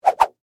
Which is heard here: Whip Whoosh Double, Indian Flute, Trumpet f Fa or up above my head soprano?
Whip Whoosh Double